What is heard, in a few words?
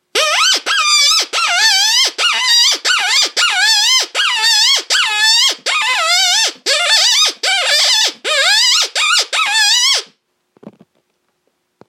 Mini Meanie Low Pitch, fox calls